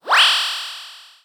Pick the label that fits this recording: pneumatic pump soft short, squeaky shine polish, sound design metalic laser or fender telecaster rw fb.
sound design metalic laser